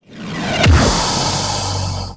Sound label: skill shot fire